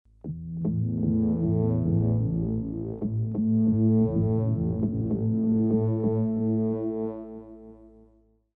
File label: Mooer Synth Drum Patch Synth Wow Bass